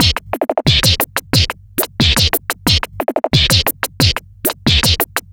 littlepunch 090bpm, Downbeat Dance Grooves; Zero-G

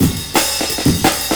jazzy, breakcore

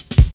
Hard Stepz Cut, Jungle, Drum Loops; 165.5; Zero-G Jungle Warfare 1, 2 & 3; 90 s Sample CDs

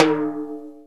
Timbale Hi

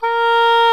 Oboe, Orchestral Winds; Roland LCDP04 Orchestral Winds; Oboe 4-8; Oboe f 5

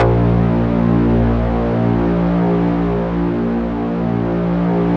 A#1, Yamaha VP-1; Music Store; 90's Sample CDs

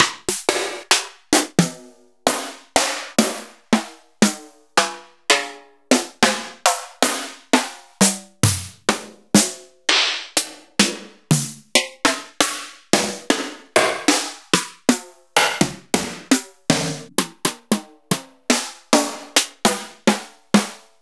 Akai MPC500 Snare TKit

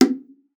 BONGO B2, bongos; Best Service ProSamples vol.42 - Session Instruments

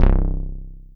Bass, FM; Polyend

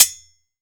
SPOKE HHF, SPOKE HI HAT

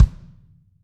AMB KICK; ST AMB KICK

